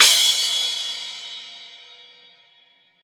big room crash sample g sharp key; cymbal drum samples

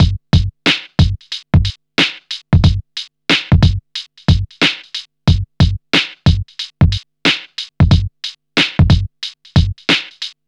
91 bpm drum beat f sharp key

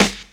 00s raw hip hop snare sound g sharp key; hip hop snare drums

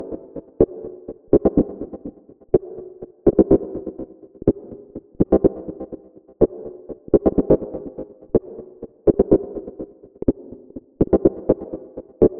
house mid bass pluck keep it sequence 124 - cm; bass loops

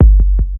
fresh bassy trap bass drum g key

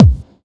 kick drum one shot c sharp key

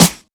dry steel snare drum sample f key; snare-drum-samples

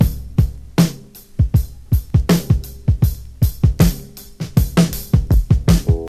79 bpm drum beat e key

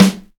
perfect snare drum sample g key

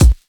smooth kickdrum sample a sharp key

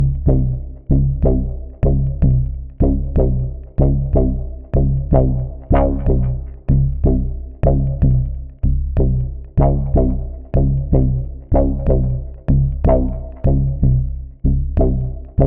detroit funkin moog bass house 124 - cm; loops

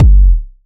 bassy kick drum single hit f sharp key

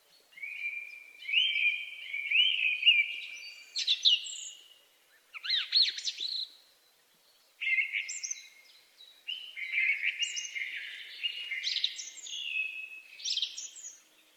song thrush bird melodic song

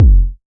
warm kickdrum f sharp key

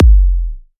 wet bass drum one shot f key; kick drum samples

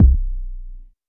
boom kick drum c key

